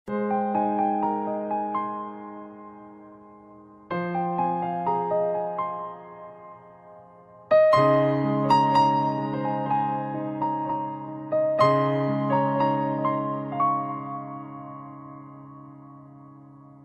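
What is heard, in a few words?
Morning Alarm Piano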